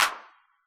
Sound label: techno kit claps